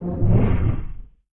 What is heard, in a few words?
Grave Strike; Sid Meier's Civilization 4 Complete; Beyond the Sword; Units